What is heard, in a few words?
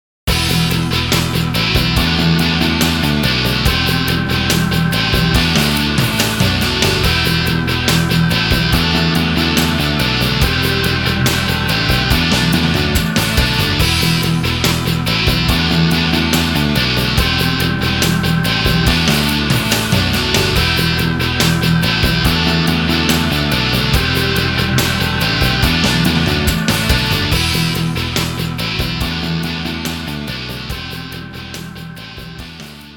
jingle; Shin Megami Tensei - Devil Survivor Overclock